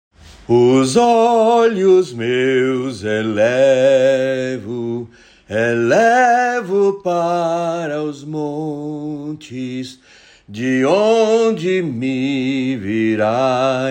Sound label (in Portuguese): salmo cantado